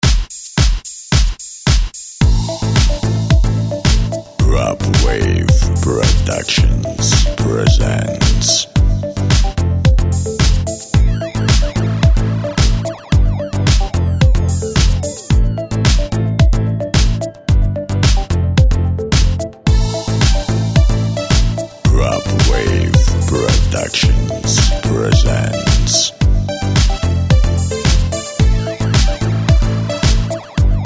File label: Beats